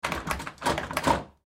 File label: Door Weather Strip, Door Weather Strip Door Handle Turn Locked; Household; Door Handle Turn Locked Fast; Miscellaneous Door